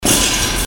pneumatic blast

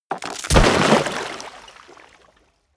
frozen surface break

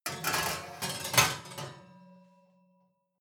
oven baking sheet draw in

